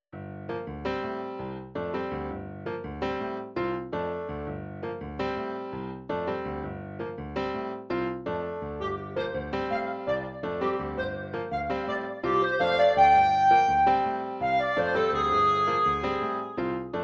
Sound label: Piano Duo, MIDI